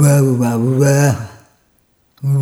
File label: Rhythmic G